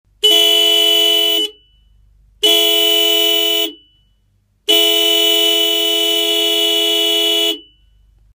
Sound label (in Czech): klakson 12v diskovy 340 430hz stebel hf80 2